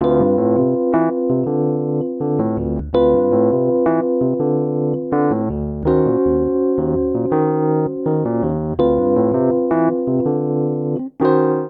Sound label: Vamp Upbeat Sideslip D Minor - Eb Minor 82 bpm, Electric Keyboards; Ableton; Factory Packs